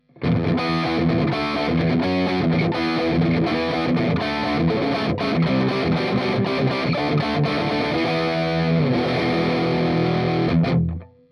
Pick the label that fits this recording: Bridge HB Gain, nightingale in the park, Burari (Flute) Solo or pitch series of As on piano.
Bridge HB Gain